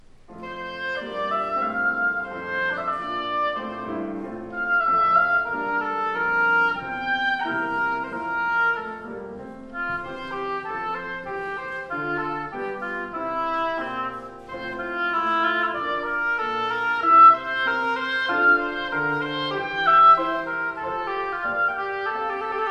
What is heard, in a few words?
Oboe; Offertory; MUSIC MINISTRY